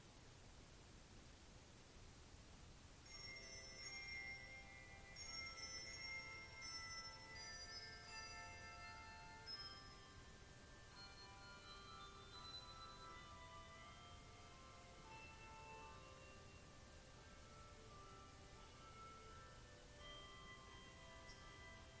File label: Bells anthem